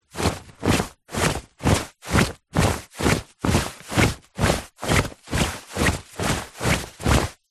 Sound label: clothes shorts walk movements; foley